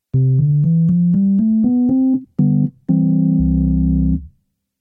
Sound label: Rhodes Piano Bass